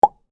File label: pop